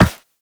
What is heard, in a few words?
Operator Run Metal, Footsteps; Warframe